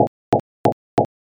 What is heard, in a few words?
fs carpet